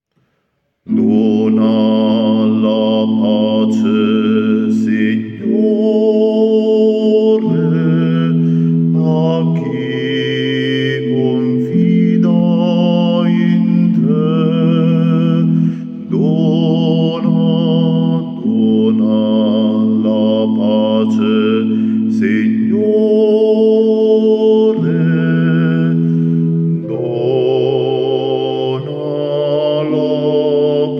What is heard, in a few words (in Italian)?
bassi; Dona la pace